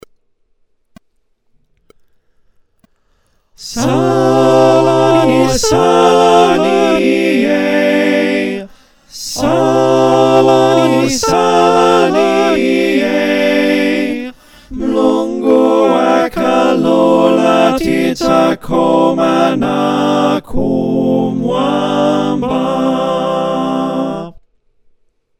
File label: SATB